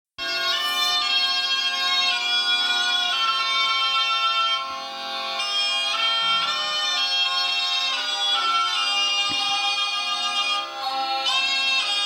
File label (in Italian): Zampognari